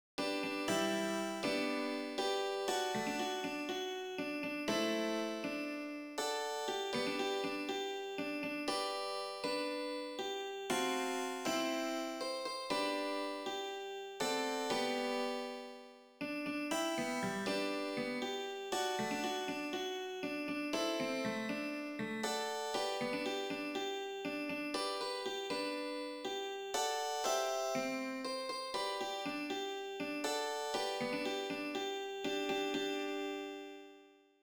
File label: Happy Birthday Jazz